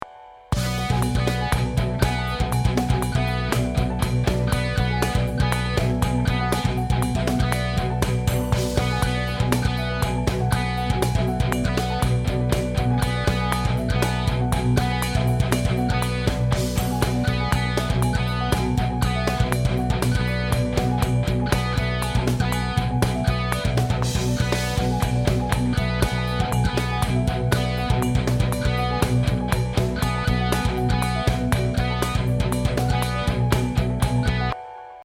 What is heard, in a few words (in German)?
Neckbreak Beispiel